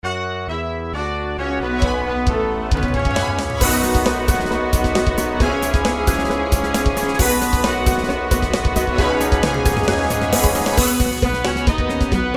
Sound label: Victory Anthem